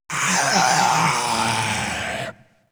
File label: gorefast idle; kf2; gorefiend; slender